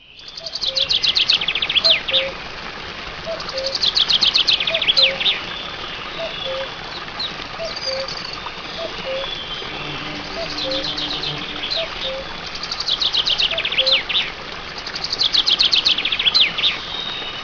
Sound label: Birds Chirping